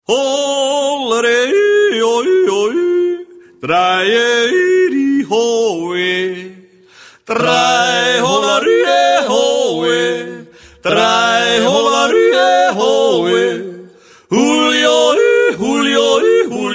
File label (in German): jodler